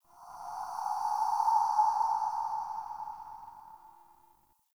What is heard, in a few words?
Spirit Gust; Ambience; Dungeons; Under City